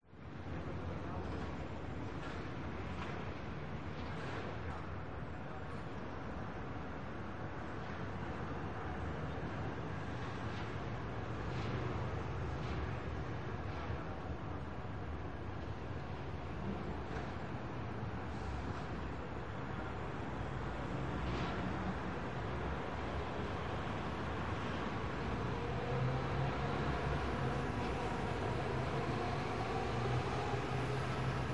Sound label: ambience amsterdam de pijp 8am traffic birds city noise; PMSFX